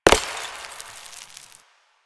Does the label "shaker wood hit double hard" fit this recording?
No